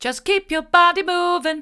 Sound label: just keep your body moving hard, vocal